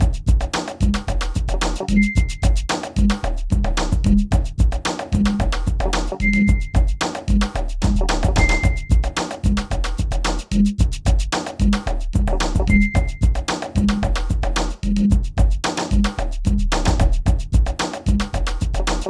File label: BEAT, Beats 105-150bpm